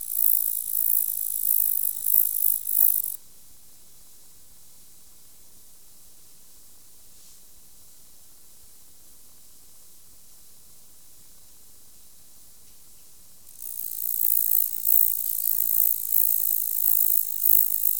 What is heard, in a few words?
Roesels katydid; Insects